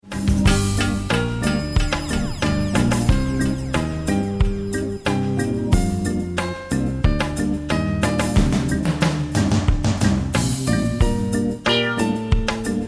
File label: instrumental